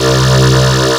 lead; Mono

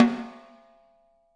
6X12 WOOD, drums; Living Drums

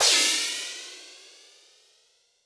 Mega Drums, Korg 01W

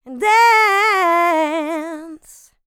licks, vocal, dance; ILIO; Vocal Planet

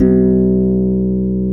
HRP C. HARP; Roland - LA Composer vol. 1

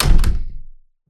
perc door hit

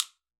Steel Drum Sticks A4, Calypso Steel Drum; Percussion

